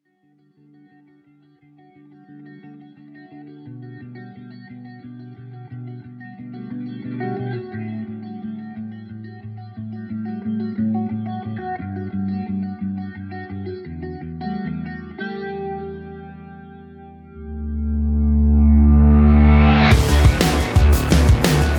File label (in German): fetter synth rock